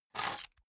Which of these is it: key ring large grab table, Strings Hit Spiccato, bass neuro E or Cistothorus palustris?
key ring large grab table